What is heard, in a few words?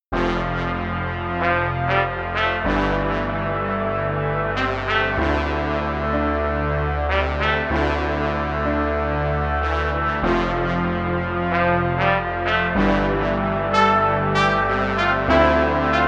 Morning Melody 190 BPM